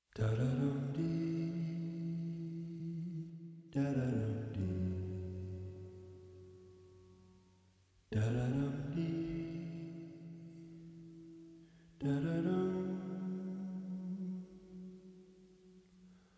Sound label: Hum Piano